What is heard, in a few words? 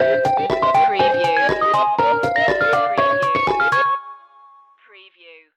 8-bit boss stinger